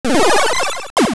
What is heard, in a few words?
Arm Shoot; Vaati's arm shooting at Link; Vaati's Wrath